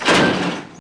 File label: impact; Urban Chaos